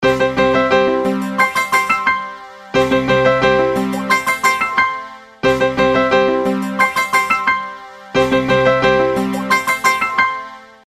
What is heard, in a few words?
Jingle